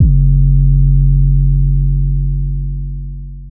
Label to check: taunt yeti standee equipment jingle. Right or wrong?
Wrong